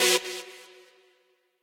saw

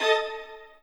strings

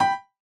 chorus chord B6 G6

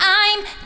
vocal

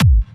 soft hit whistle

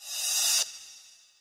Buildup

